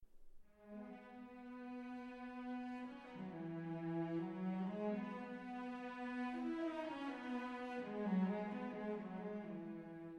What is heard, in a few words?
cello